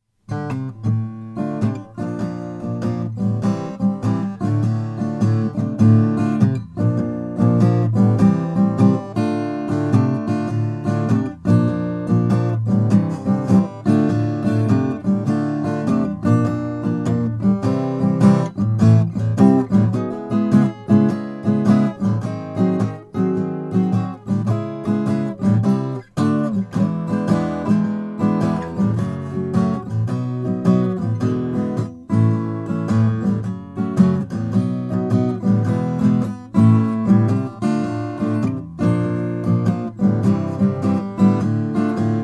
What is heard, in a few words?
martin 000 28ec indian rosewood